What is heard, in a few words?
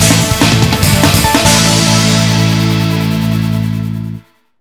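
jingle win team; VF5FS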